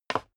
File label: step sneaker wood creak slide short; schritte